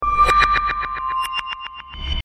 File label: Light Bulb; Jesse McConnell